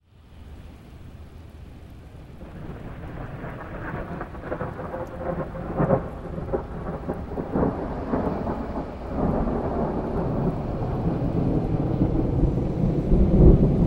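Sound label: thunderstorm sounds; zvuki grozy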